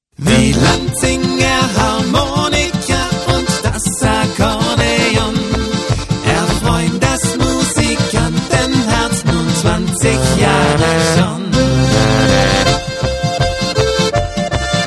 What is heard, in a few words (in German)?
Harmonika Werbung, Lanzinger